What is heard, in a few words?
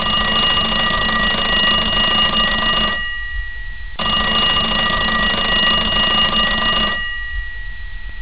phone ring old